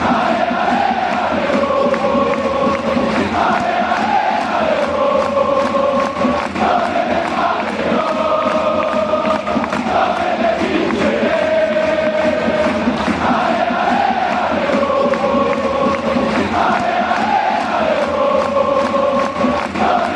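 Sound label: fanchants, football chants; dovete fare gol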